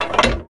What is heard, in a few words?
Lever Large Metal Switch